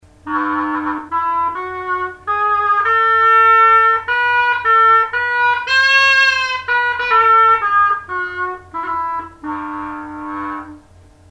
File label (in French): Sample Hulu, gamme